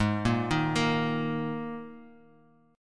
G sharp Suspended 2nd, chord; arpeggiated; 16,13,13,15

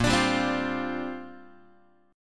A sharp 6th Add 9th, Guitar; A#6add9 strummed; JGuitar

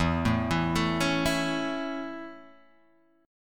Db Minor Sharp 5th E

